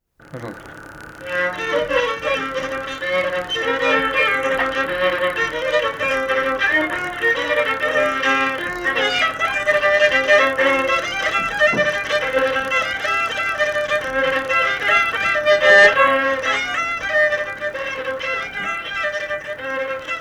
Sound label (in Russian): Камаринская, Кульнево